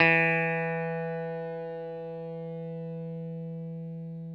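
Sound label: 6 string bass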